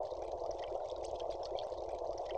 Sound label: Flare Burn Underwater; Playable Tutorial Level